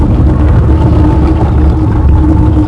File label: Sewer Blocks; Finding Nemo; XBOX